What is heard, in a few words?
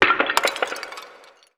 urn break; dota; crownfall